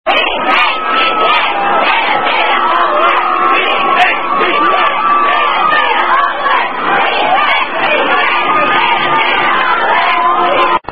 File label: Kappa Alpha Theta Singing, Sorority Chant